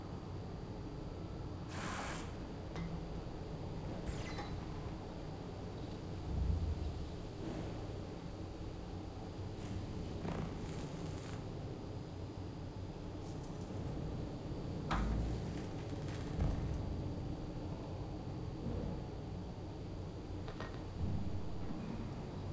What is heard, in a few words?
sfx amb dome training; tft sf2023